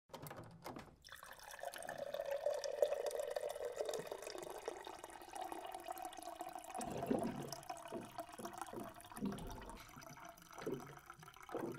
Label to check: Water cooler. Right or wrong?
Right